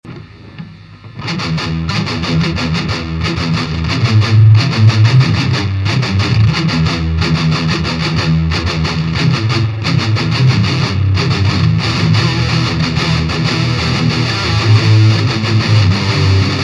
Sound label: Riff